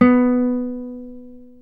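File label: classical guitar, nylon string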